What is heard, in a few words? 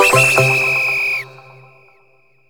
TIMEUP; maimai